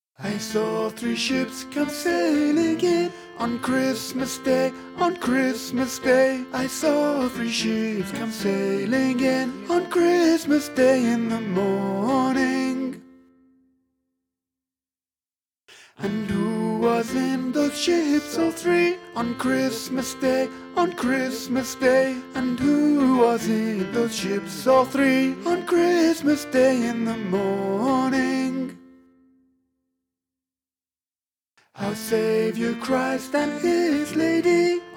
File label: tenor; christmas; I Saw Three Ships